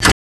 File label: fx-percussion, Techno; Techno ID; single-drums